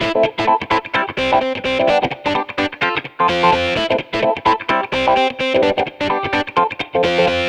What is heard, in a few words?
Electric Pop Guitar 128BPM Amin; Electric Pop Guitars; Vengeance - Essential Clubsounds Vol.4; Guitarloops